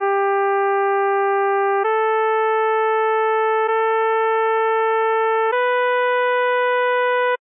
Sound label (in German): Mod c g dur rein sopran; nur Sopran rein; Modulation; Psychoakustische Grundlagen der Musik